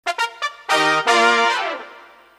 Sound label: brass